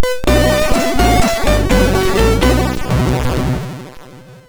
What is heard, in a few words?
sid MOS6581R2